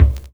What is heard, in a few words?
300 HZ